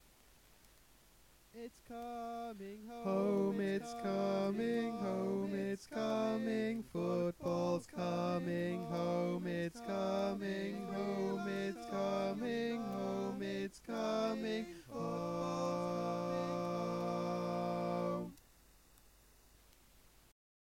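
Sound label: baritone, barbershop tag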